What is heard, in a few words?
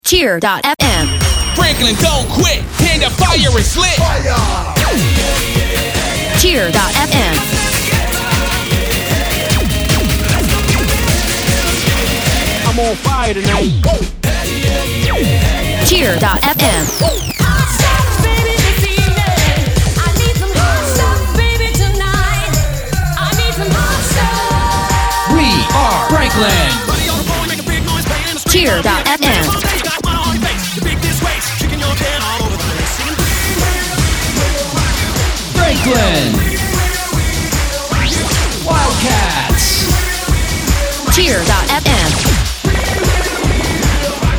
intro with loop